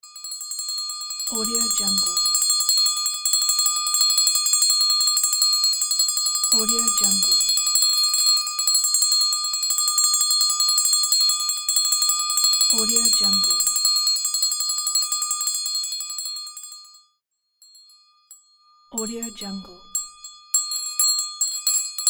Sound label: school bell; incredible sound